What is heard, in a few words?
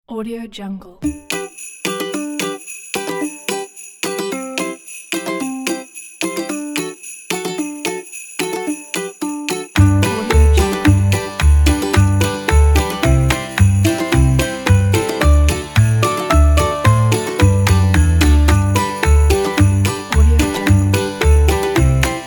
jingle bells acoustic